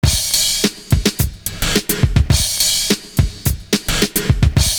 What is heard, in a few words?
nice snr; Univers Sons